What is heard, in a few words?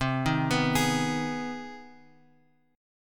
C Minor Major 7th Sharp 5th, C; CmM7#5 Chord {8 6 9 X 9 X} arpeggiated